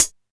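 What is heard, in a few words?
hat closed, Alesis DM5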